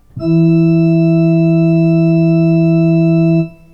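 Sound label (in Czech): varhany d dur, organ D major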